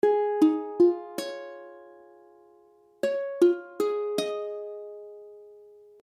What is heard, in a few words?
Cisadd9, ukulele; ukulele akordy; C#add9; C# akordy (=Db akordy)